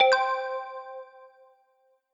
error Sound; BingoCard

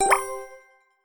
drop Sound; FractionStrips

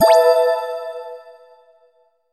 egg Sound; EggHeads

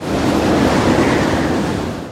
storm wind; MainIdea

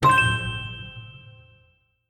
Turn Sound; BattleBuddies

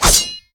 sword; BattleBuddies

